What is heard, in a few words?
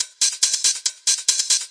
140 open hat bright, drum loops and breaks